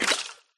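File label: cookie clicker harvest sound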